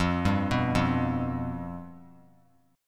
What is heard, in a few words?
F Suspended 2nd Flat 5th, chord; arpeggiated; 17,14,14,15; JGuitar